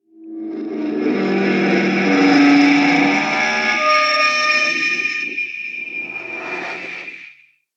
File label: Resonating Metal Horror Screech